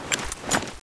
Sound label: draw; tfa cso; x-7